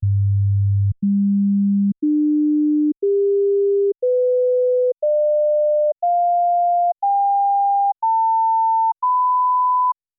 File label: sinus tones 48kHz; OmniSource